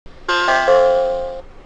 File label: Decel, Train; OpenBVE